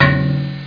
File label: boing!